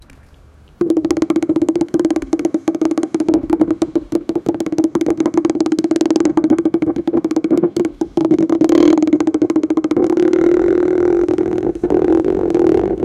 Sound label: gongalo sound, cultural